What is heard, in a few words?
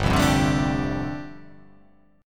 G 9th Suspended 4th